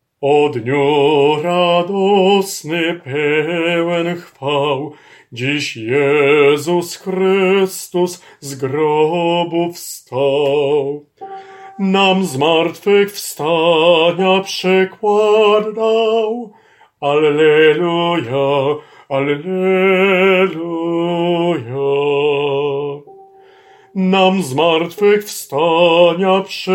O dniu radosny ALT

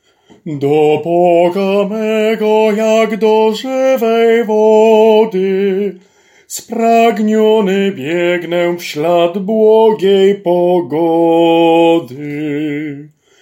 TENOR BAS; Do Boga mego

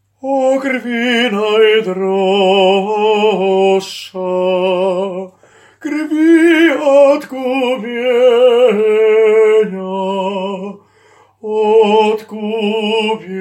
O Krwi SOPRAN

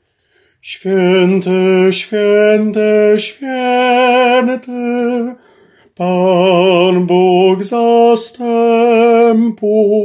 Swiety Swiety SOPRAN

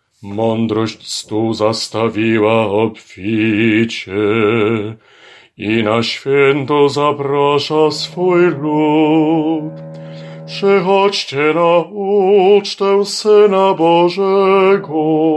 Madrosc stol ALT

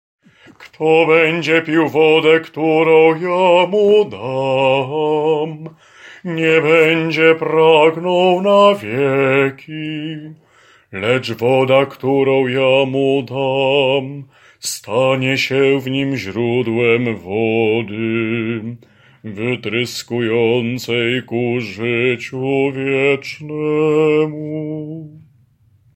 Wody Jordanu BAS

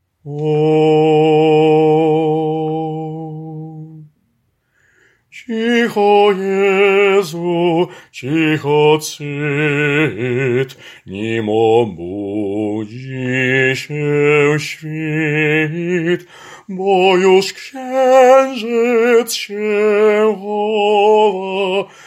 Kolysanka BAS

